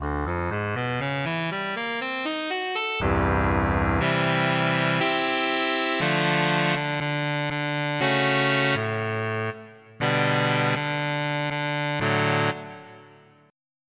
Alto Sax; EverQuest

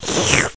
lick

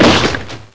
Smash

